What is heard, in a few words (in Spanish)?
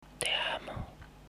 susurro